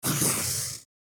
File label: Pencil drawing smooth medium stroke